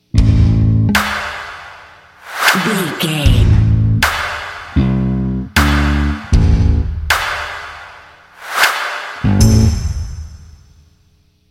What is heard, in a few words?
bass and drums